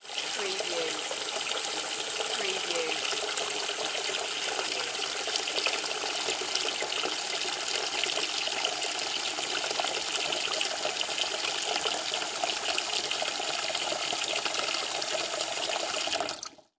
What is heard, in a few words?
Bath Tub Fill; sound effects bible